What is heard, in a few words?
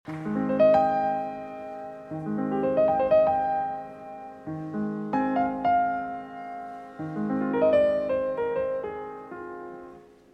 Piano Concerto in C Minor, Larghetto (Live); Mozart; Piano Concerto No. 24